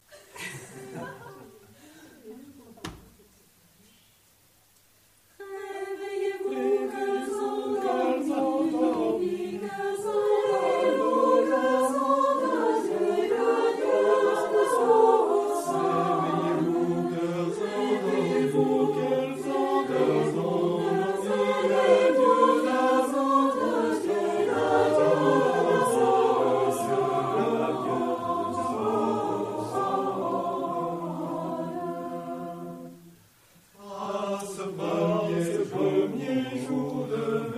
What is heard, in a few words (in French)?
chant oiseaux